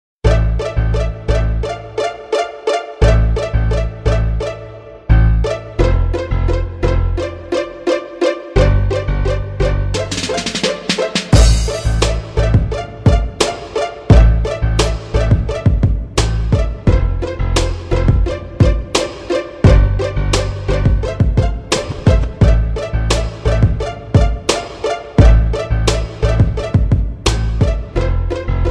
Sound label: westcoast funk flow, hip hop ringtone